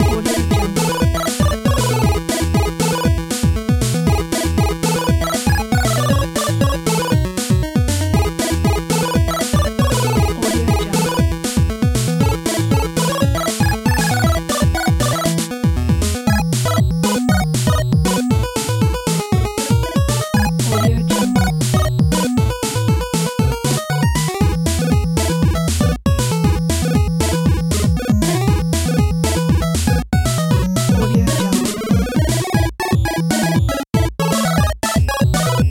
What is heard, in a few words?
8 bit arcade